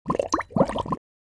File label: Cartoon bubbles